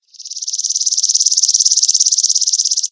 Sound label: flit, forest; video games; ambient; source engine; portal2